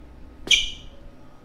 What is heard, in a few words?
squeak, basketball; Nickelodeon Basketball Stars 3; ThrillGames